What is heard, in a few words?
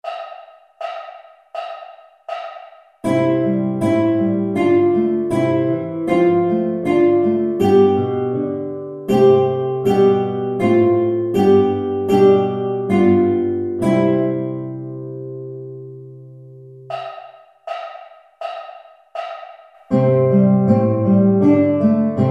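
1st string, guitar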